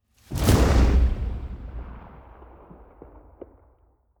soft gunshot whoosh; schusswaffen

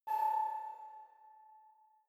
sci-fi facility ai awake; soundpool

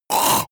monster choking zombie short

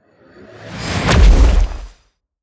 promo pad; whooshes rises

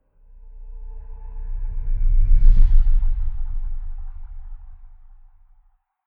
spaceship small flyby sonic boom; raumschiff

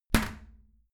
toilet lid close heavy, badezimmer; haus-garten

